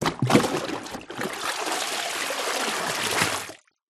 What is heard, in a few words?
household plastic bucket fill up with water from swimming pool then pour out